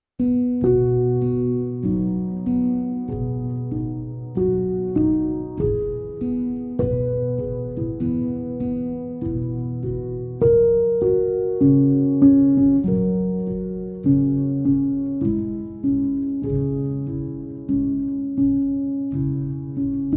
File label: Instrumental